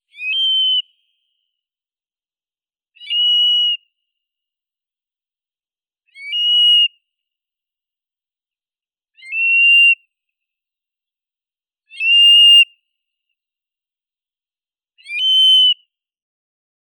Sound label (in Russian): avdotka burhinus oedicnemus